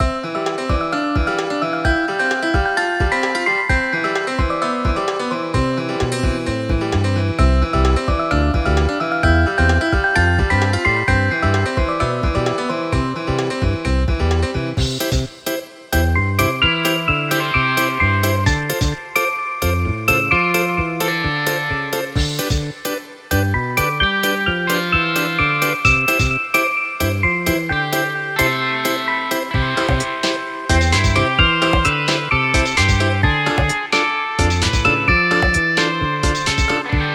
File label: Like an amusement park fine and bright music